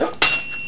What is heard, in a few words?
SWORD, WEAPONS